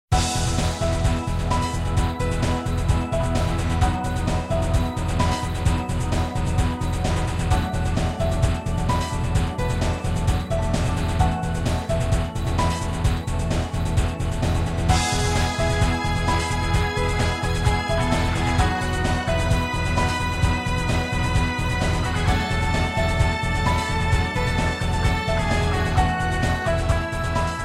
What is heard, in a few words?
Music; MK64; Victory Lap